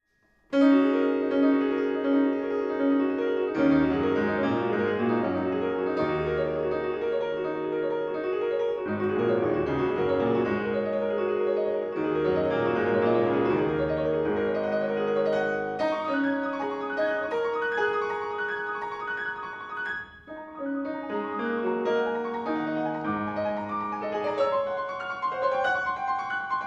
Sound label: Prelude and Bassadanza